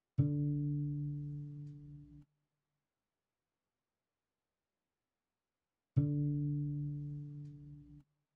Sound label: 166 BPM B Min Muted Harp; Melodies; Loop Stems; Cymatics; 2022 Melody Mystery Expansion; Midnight In Seoul